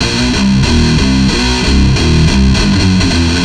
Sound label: Riff, Industrial; Nasty